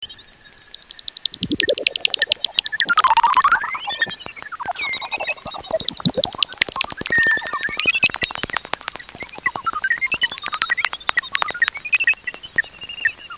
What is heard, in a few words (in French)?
chauves-souris; en chasse à l'extérieur; sonotheque